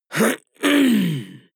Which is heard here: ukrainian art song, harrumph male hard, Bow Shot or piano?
harrumph male hard